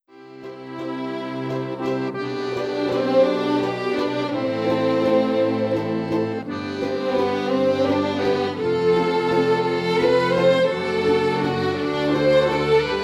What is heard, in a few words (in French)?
instrumental